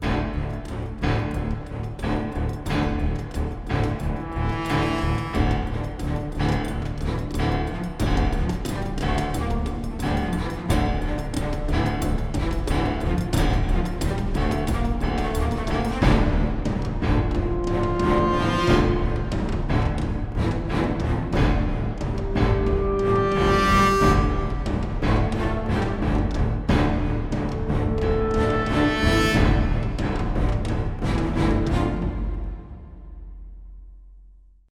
Motivational; Crysis

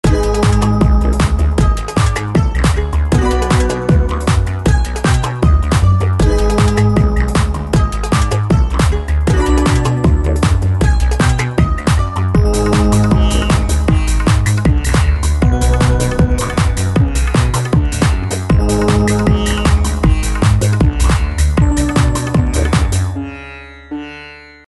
Lava Palaver - Loop; Chaos Faction 2

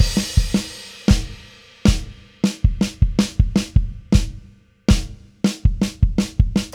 Music Transition Perc; Plants vs. Zombies Battle for Neighborville; GnomeGnight

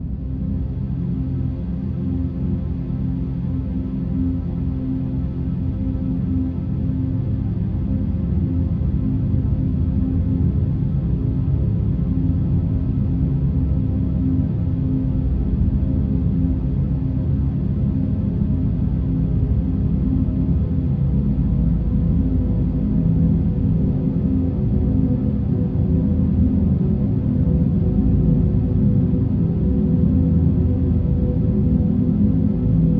Defeat Loop

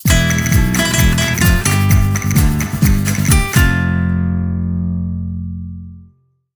Fight Lose; Don Flamenco

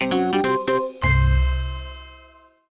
complete; lego my style preschool

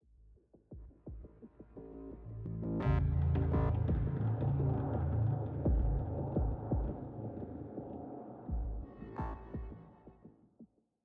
Military High 85bpm

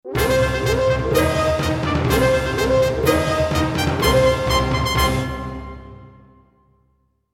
Stinger- Combat Victory

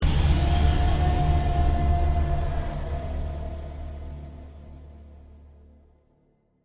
Pay Fine Stinger; Need for Speed

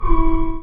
Alarm Siren Loop

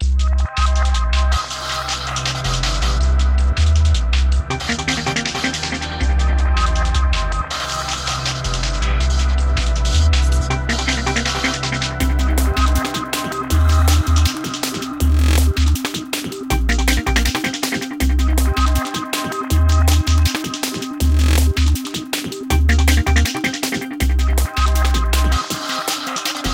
Space DnB

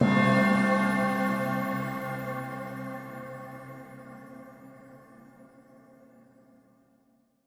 Stingers sting chance success

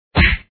hit 2 sound

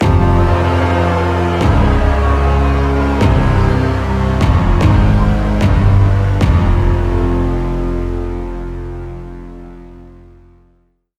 Failure; Typical Colors 2

